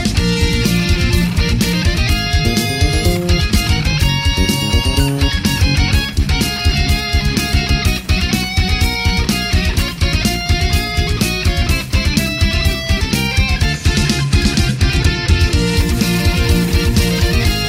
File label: metal ringtone; zelda